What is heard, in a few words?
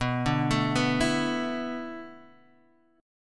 B Minor 11th B down, Bm11 chord; arpeggiated; JGuitar